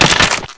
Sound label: zombie neck break